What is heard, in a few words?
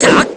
heavy slash; hibiki